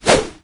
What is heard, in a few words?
attack swish, maggot; doom3